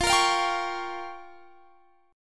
C sharp 6th Flat 5th F

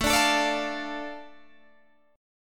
A Suspended 4th Sharp 5th

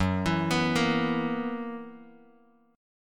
Gb 11th, Bass Standard; Gb11 arpeggiated; JGuitar

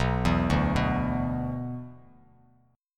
B-Minor Double Flat 5th B down, Bass Standard

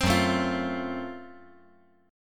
Gb Minor Major 7th Flat 5th, chord

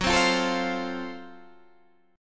Gb Minor Major 7th Flat 5th Gb Guitar Open D; 16,15,15,15,15,15; down; chord shape

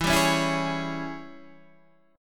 G sharp Major 7th Suspended 4th Sharp 5th, E

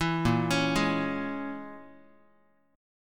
A sharp Minor 7th Flat 5th, Guitar; arpeggiated; 7,6,4,6,x,x; 4A,4E,5A,5D,5G#,6C#; JGuitar

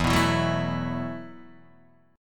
E Suspended 2nd Suspended 4th E, Guitar, Open D; 2,2,2,0,0,2; down

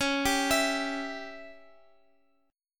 Db Diminished Db Guitar Open F; arpeggiated; x,x,x,8,10,11

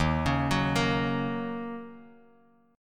Eb Minor 7th, down, Guitar, Drop D; arpeggiated

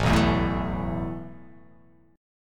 A Suspended 2nd, guitar chord; Asus2 strummed

